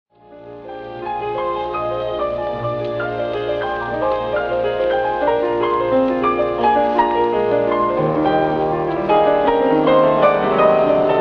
Piano Concerto Allegro ma non tanto, Philharmonic Symphony Orchestra; Rachmaninoff; No 3, Op 30